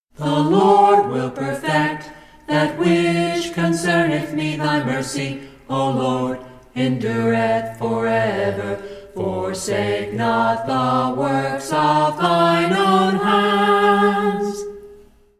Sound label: a capella scripture song; psalm 138 8